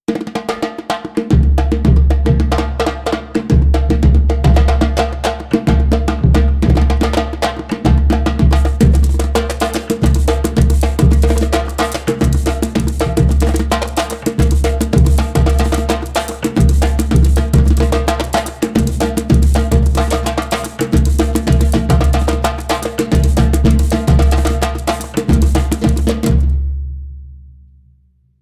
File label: maracatu